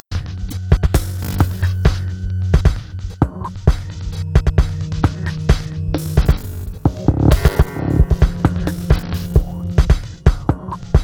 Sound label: Guitar